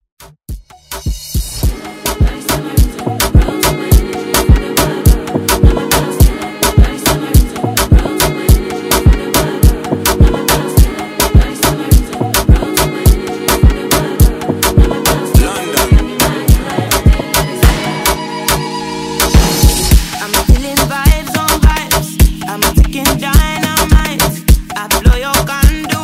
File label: Moombahton Afro Redrum